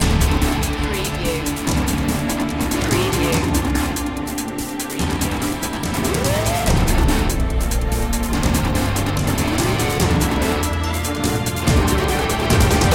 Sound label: arcade rock